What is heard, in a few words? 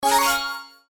Push; pucca n friends ios